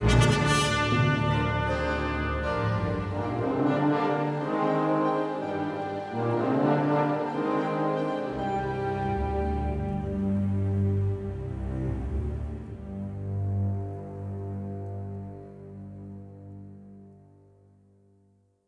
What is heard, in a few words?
music flourish, intro